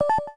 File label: Keytone; LG GD550 Pure; Keytone sounds